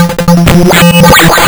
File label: lsdj waves; wavetables